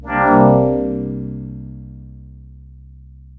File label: Synth, Cool FM